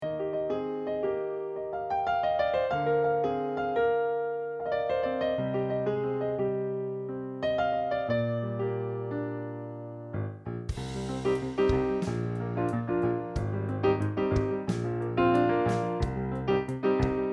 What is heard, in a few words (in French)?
soprano refrain voix principale; Notre-Dame de Vouise